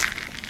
gravel footstep

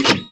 piston extend

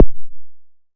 button pop; mesecons

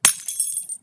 break glass

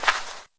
sand footstep

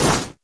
snow footstep

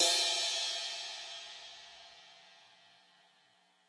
noteblock crash; mesecons

